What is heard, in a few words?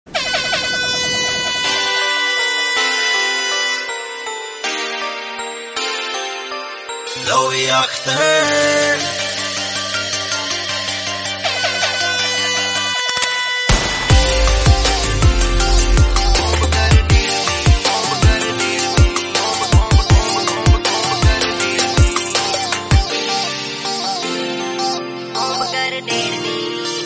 Instrumental BGM